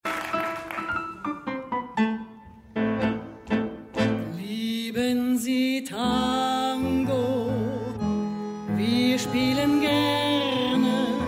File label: Lieben Sie Tango